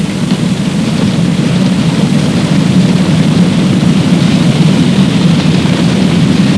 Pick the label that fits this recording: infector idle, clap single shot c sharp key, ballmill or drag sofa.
ballmill